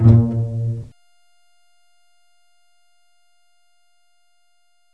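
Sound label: Pizzicato Strings A#2; knights try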